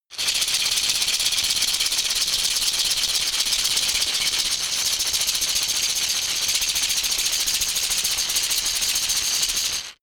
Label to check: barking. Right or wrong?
Wrong